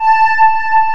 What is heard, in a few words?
DIDI PAD, SYNTHESIZER; AKAI S6000 CD-ROM - Volume 1